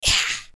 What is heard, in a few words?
hiss; Assemblee SFX